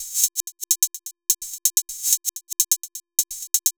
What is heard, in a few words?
Hihat with Reverse 127 bpm; Loops; Drums; Top; Ableton Live 12 Lite; Core Library